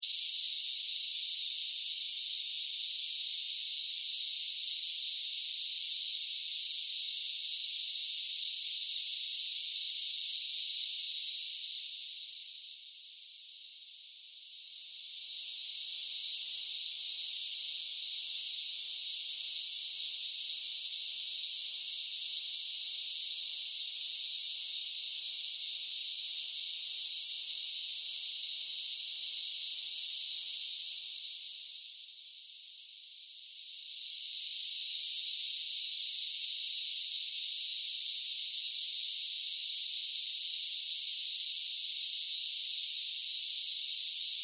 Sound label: Cicadas Sing, Animal, Cicadas, Large, Groups, Singing, Insects, Bugs; 5.1; Surround Sound; BlastWave